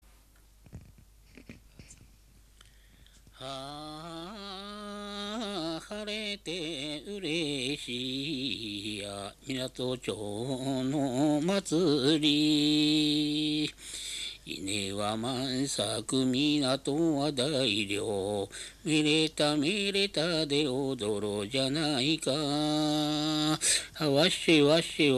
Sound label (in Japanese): tennousai no uta, minyou